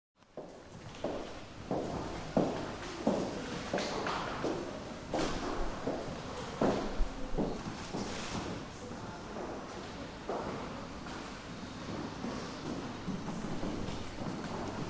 Art Gallery, Ambiance inside a public city Art gallery with people walking on a wooden floor; Buildings